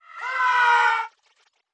death

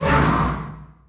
Liquimon hit; SwampOfDarkness

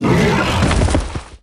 Magma Gladiator death; IgnisVolcano

